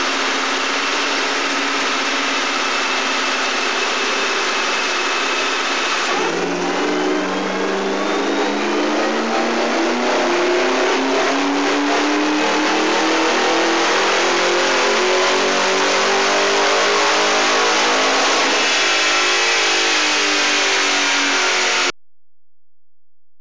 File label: Dyno sound; 402Rwhp